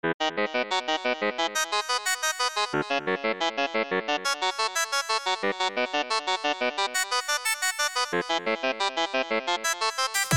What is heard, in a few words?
basic with limiter